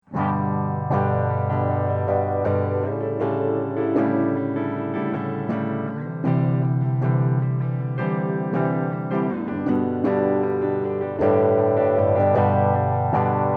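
three quarter depth half feedback guitar, phase shifter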